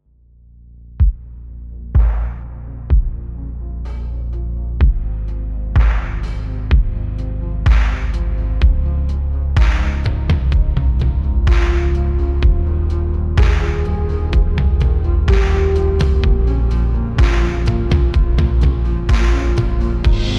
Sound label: Beatdown